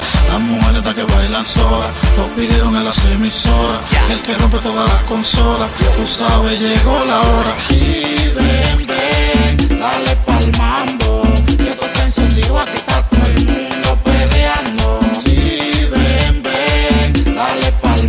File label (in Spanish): merengue electronico